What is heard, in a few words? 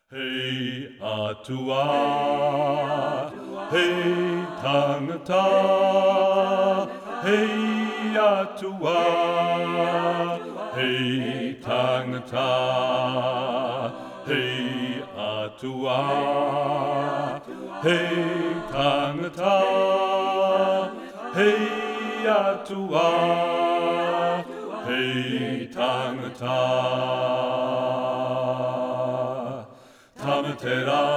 bass; he atua he tangata; sing for water 2020